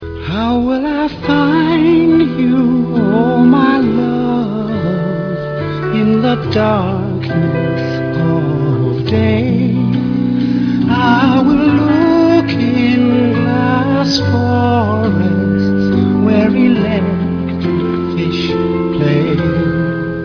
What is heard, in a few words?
folksong